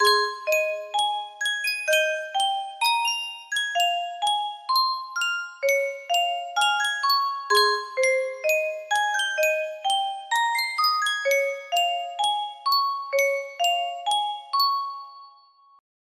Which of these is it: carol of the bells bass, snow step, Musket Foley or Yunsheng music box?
Yunsheng music box